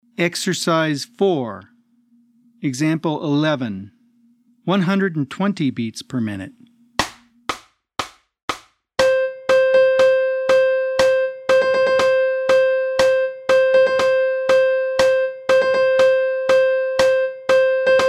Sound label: 120 BPM